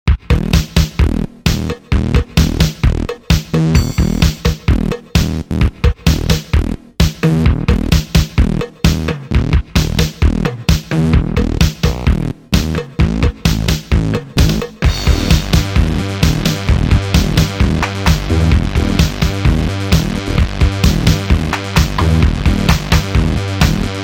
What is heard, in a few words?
rock in concert